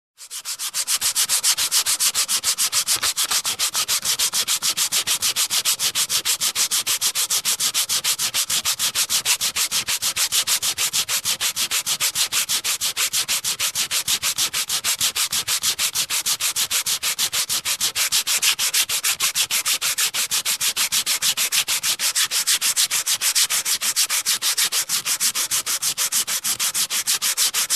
Construction

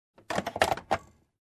Aircraft Cabin Cargo Hold click

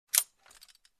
Strap Sound

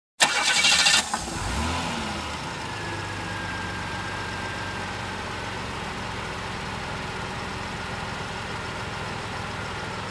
Car engine startup and drive away

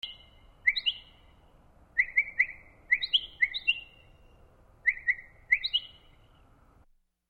Birds tweeting and whistling

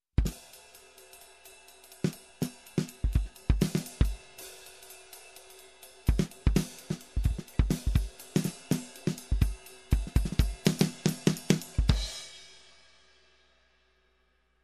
Jazz Drum Groove

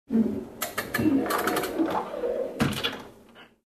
Creaky Metal Door

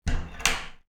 Glass door closing

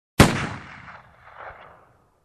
Gun Fire sound

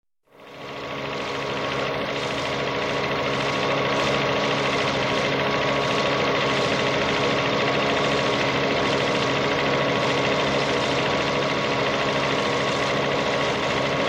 Helicopter on Helipad

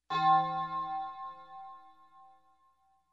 Deep Tubular Bell Ring

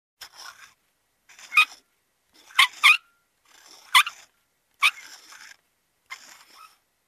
Screw on Squeak

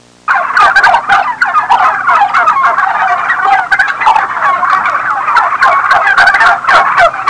Clucking turkeys

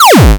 Long Electronic Button Sound

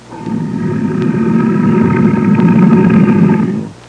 Lion long snarl

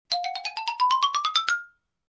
Cartoon climbing sound effect

